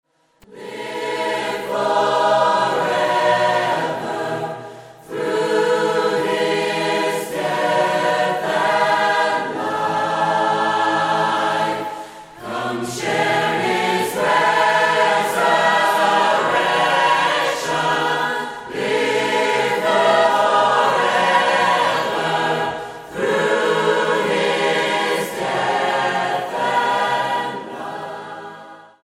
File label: i see the cross choral introduction